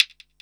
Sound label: Internal Drums, Roland JD800